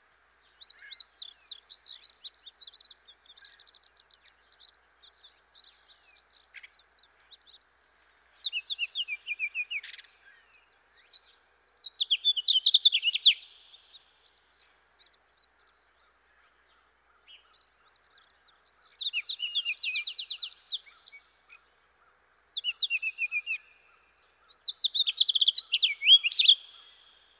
Song Sparrow and Baltimore Oriole

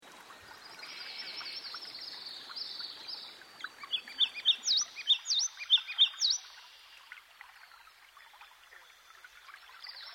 Ruby - Crowned Kinglet; Dan Gibson; Songbirds By The Stream

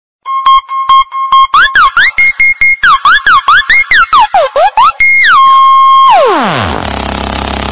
Funny SMS Ringtone

